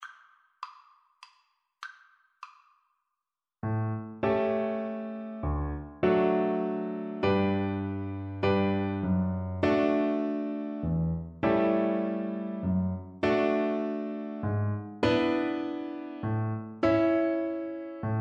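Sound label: viola karaoke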